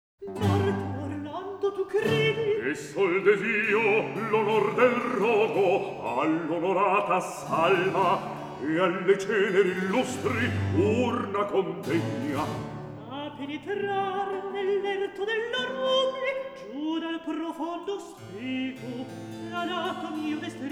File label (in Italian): Recitativo; Atto III; (Astolfo, Ruggiero)